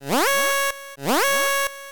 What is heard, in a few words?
warning